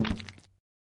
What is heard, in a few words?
Hit Web; Lethal Company